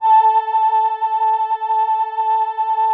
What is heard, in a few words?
VP-330, String Machines